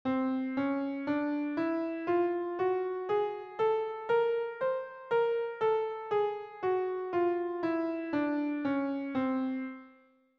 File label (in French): gamme transpo